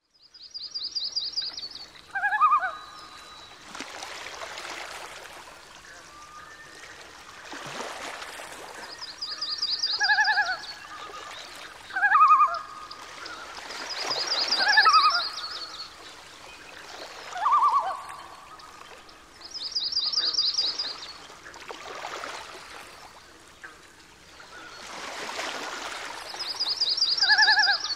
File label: cry of the loon